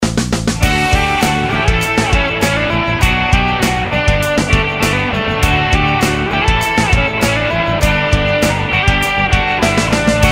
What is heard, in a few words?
music